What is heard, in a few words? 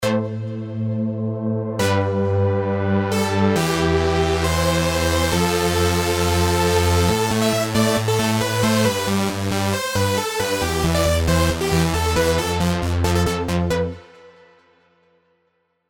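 synth, Serum supersaws; Unseen Sacrifices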